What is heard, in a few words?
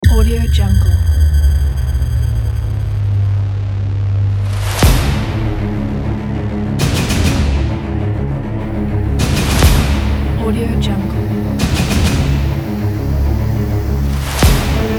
trailer